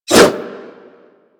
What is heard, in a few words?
Dagger Heavy Whoosh